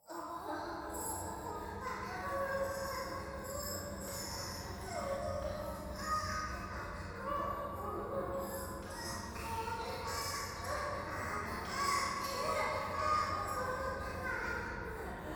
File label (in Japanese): 教会 低音喘ぎ 電車